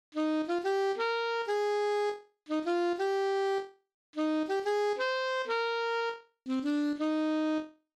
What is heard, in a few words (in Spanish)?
Saxo Alto en C